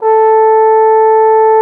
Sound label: TROMBONE; Xsample VOL-3